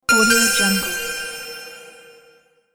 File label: spell defense cleric